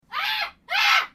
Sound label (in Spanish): grito